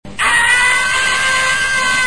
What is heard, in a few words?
shout